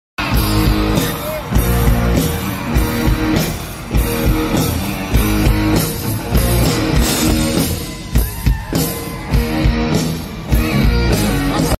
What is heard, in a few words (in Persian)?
Rock and roll, موسیقی راک اند رول بی کلام